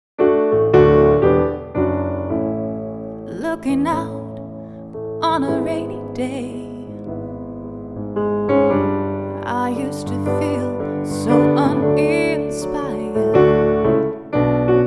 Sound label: Voice Pno